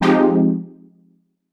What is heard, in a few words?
stab smoove, stabs